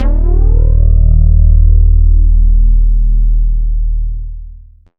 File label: BASS, D#2; Zero-G; Chemical Beats